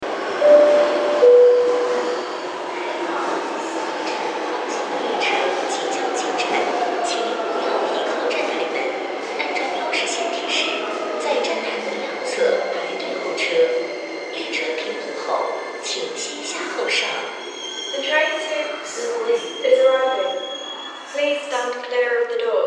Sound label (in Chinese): 八通线 四惠方向 进站